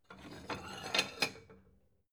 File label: plate small take slide soft